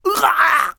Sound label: Enemy Generic Human Death Crushed